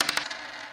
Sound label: Rolling Dice Sound Effect; decaychangedice